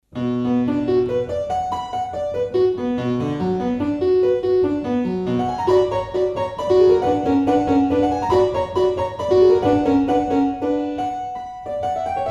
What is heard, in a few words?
piano